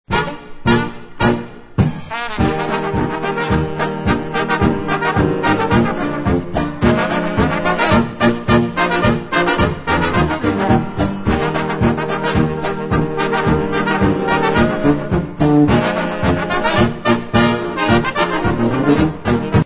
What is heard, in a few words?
trumpet flair polka